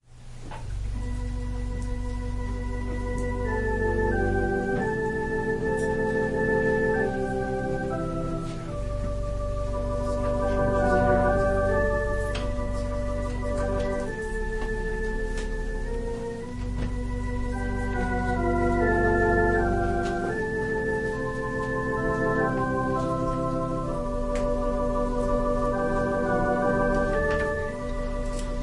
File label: Organ and violin prelude